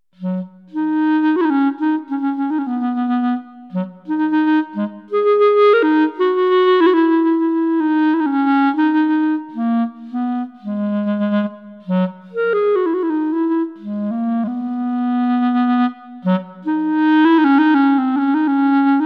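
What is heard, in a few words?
Nokturn, Klarnet clarinet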